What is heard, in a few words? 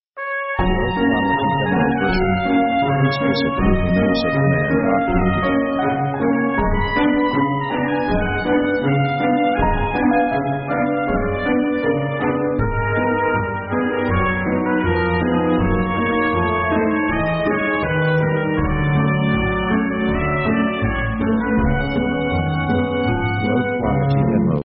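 orchestral walking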